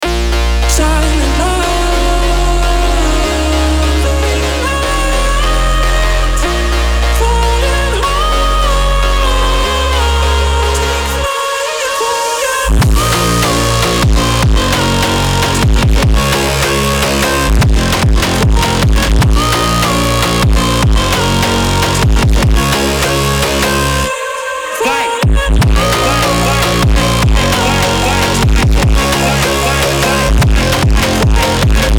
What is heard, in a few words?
phonk